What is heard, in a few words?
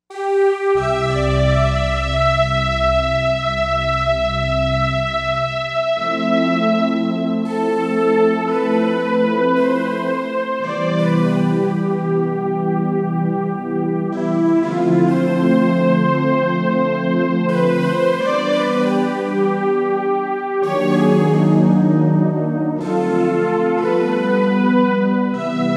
AiryString, Korg M1Rex; Factory Patches